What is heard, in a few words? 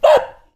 dog; nakigoe